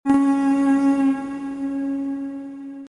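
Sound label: Alter to Starboard, Code of Signals; colregs; sailskills signals code of signals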